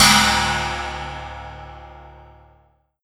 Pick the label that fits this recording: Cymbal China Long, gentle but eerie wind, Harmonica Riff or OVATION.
Cymbal China Long